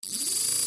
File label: Fishing Reel Drag